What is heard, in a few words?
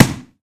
boxing gloves hit; tf